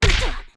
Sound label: Link Roll Smack; Link rolling into a wall; The Wind Waker; Zelda